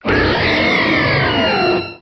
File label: cry